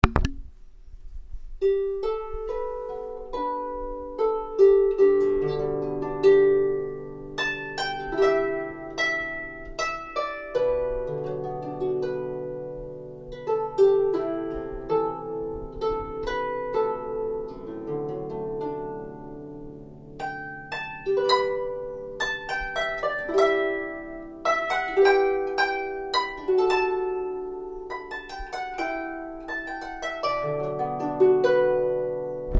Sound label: harp